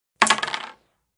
roll

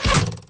bow

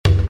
Basket, basketball; basketball-stars

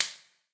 spank; spellbound

